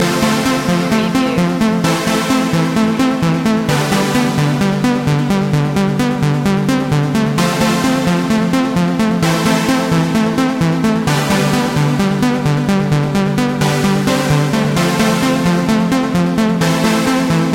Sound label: dutch influence loop